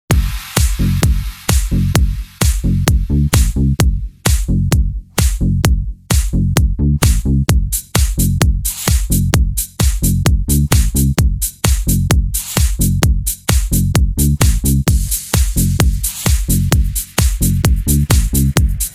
especial retro; gol g2 do gordo

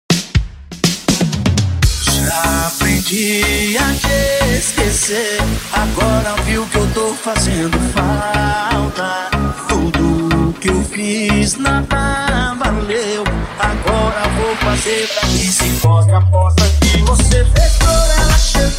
sertanejo remix

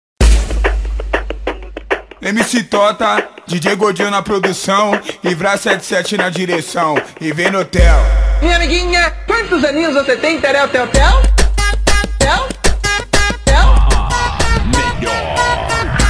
eletro funk; cd na batida do eletro funk

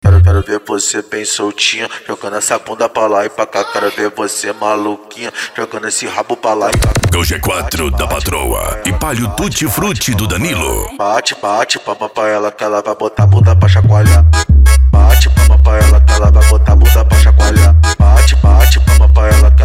funk mega bass e flash back

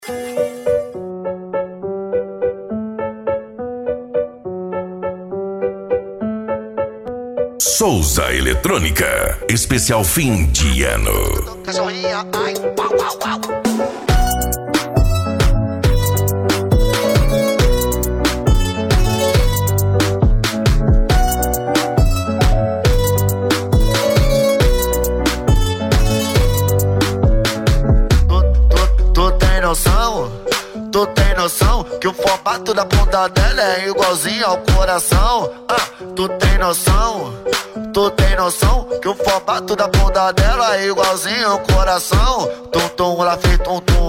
edicao automotivo; souza eletronica

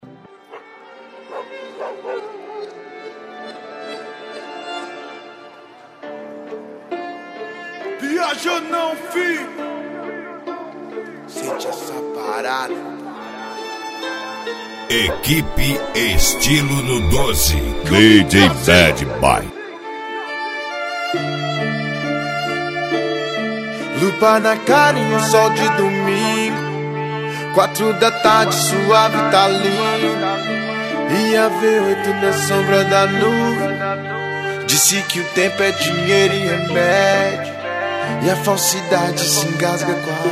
funk conciente e hip hop nacional

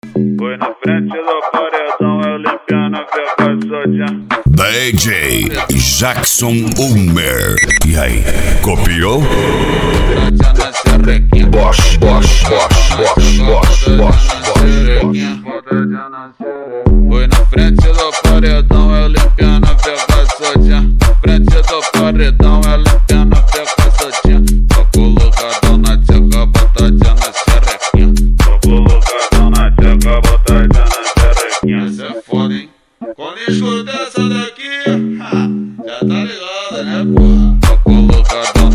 eletro funk; balada g4

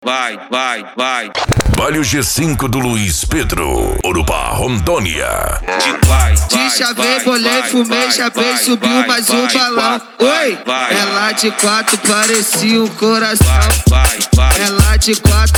funk mega bass e forro; urupa ro

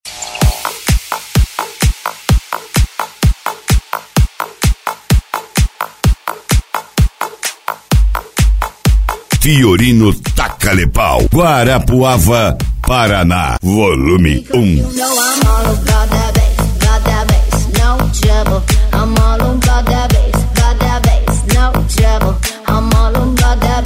especial funk e electro house

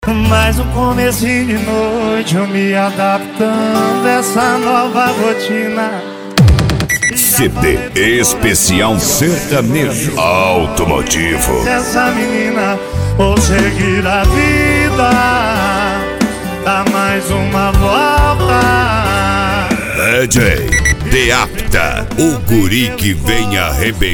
sertanejo automotivo

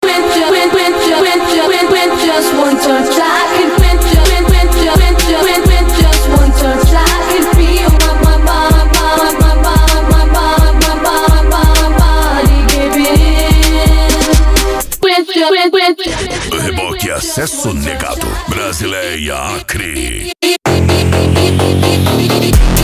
racha eletronica e funk mega bass

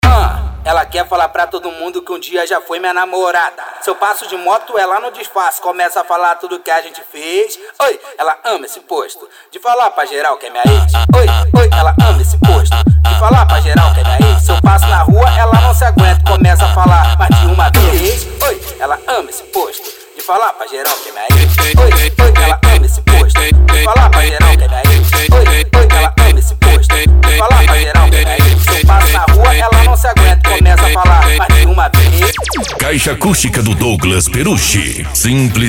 especial funk mega bass

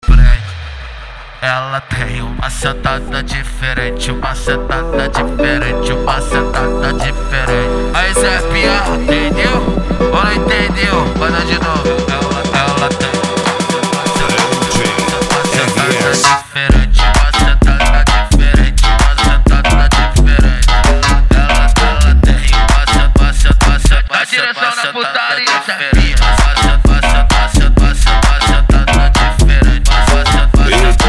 eletrofunk; cd equipe revoada especial fim de ano

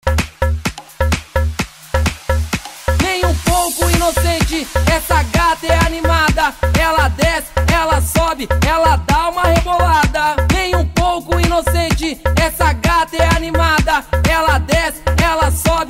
eletrofunk

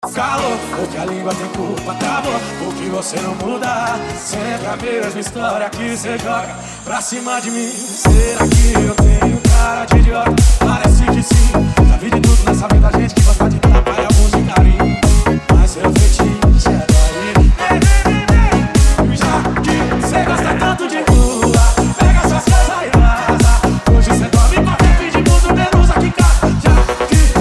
sertanejo remix

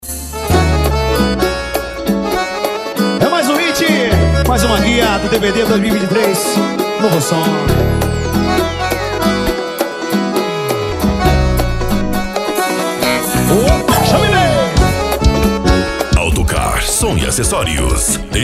sertanejo e lambadao

